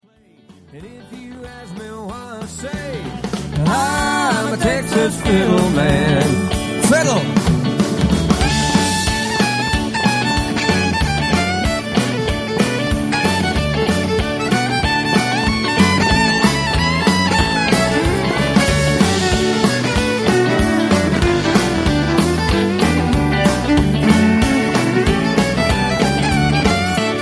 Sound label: Texas Fiddle man